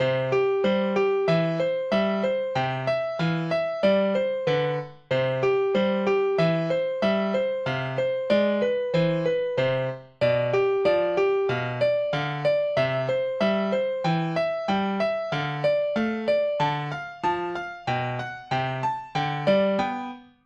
bgm menu; Kaminazo Mirai kara no Omoi de

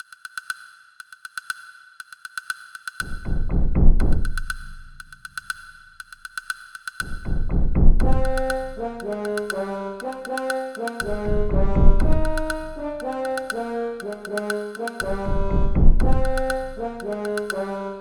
Jungle

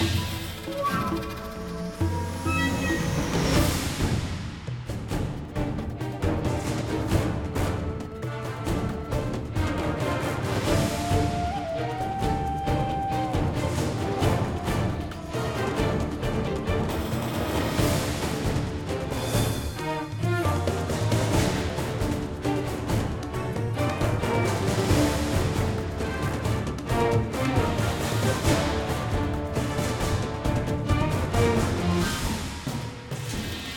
Battle Desert

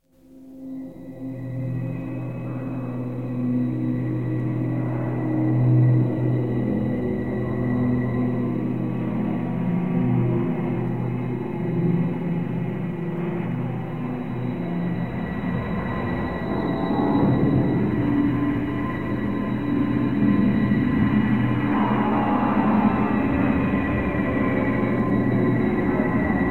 Main Menu Music; Ceres

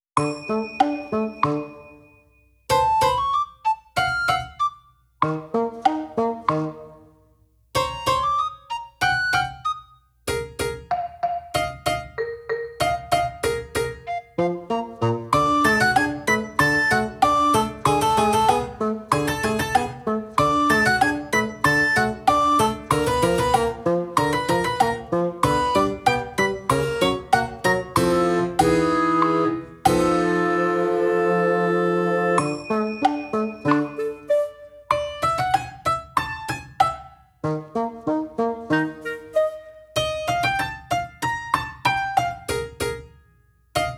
Oops...; Kamihime Project

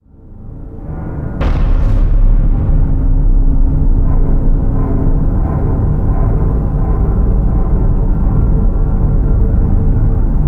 quiet; Demigod

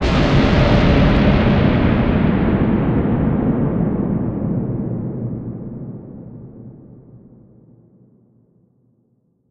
eerie hockey end, music

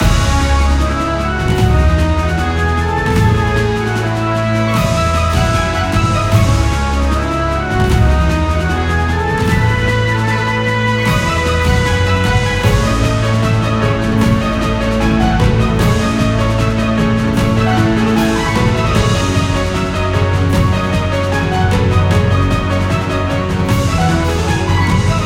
Victory

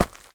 Ground Thud; Blastmorph Pinball; xbox series xs

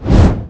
torch; sports room sfx

